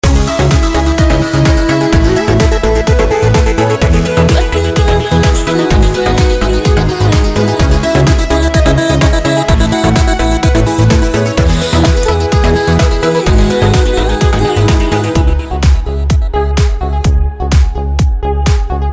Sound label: remix